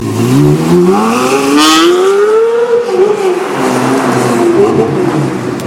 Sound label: Pagani Cinque Sound